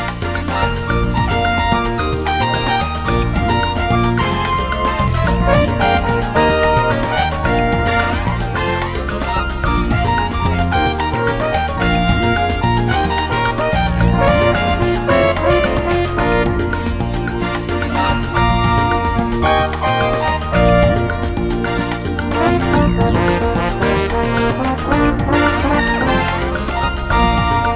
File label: Jazz Salsa